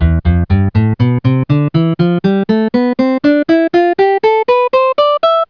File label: guitar